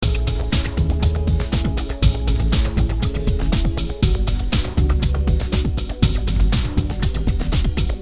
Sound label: depressing rock music